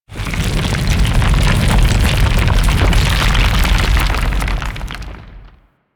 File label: Tree Slam Earthquake; Enemies; Abilities; Warframe; Teralyst